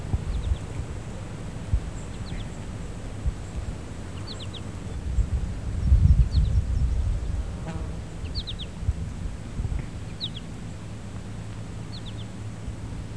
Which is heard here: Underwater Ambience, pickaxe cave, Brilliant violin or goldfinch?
goldfinch